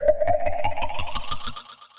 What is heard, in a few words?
Oblivion Riser; Effects; Drive and Glow; Ableton; Factory Packs